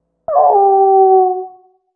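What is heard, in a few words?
animal wolf distant; amb elements; modern warfare 2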